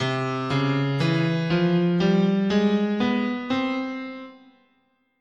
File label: C Double Harmonic Major, scale